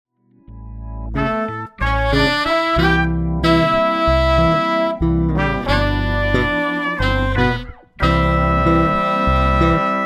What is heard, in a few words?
Calif Horn